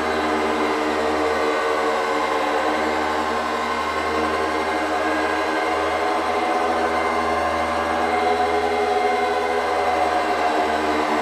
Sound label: giant fan scifi turbine; Titanfall 2